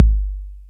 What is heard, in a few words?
KICK ACCE AX DECAY, 808